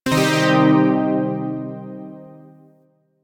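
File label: Shawzin Pentatonic Minor Chord; Chords; Warframe; Zariman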